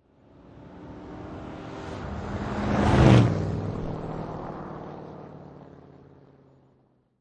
Truck Ford Drive By Left To Right; Auto, truck, motor: Ford Truck drive by, Left to Right; Trucks

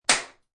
Face Slap, Slap to the face; Combat

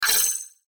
CLICK HIGH TECH; Clicks; Blastwave

